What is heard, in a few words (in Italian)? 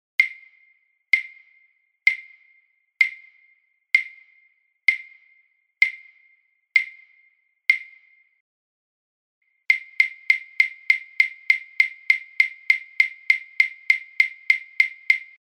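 esercizio pulsazioni regolari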